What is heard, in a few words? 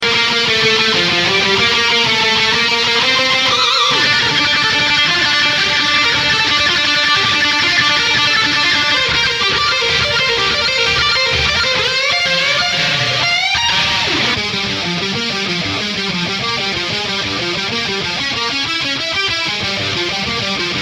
fast hard rock guitar